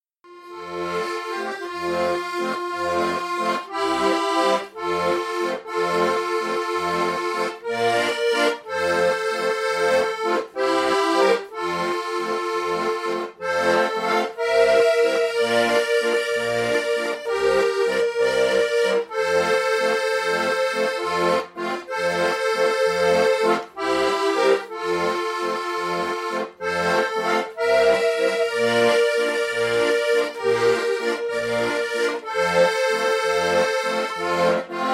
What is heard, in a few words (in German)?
Leise tont die Abendglocke, in CF